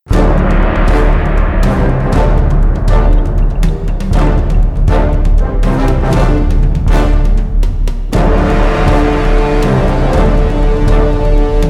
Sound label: Pirate Travel Music; Pirates of the Burning Sea